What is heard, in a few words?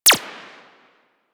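machine gun tech; Weapons; Archwing Mach Gun B; Warframe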